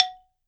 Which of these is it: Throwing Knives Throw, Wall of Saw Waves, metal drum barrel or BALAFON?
BALAFON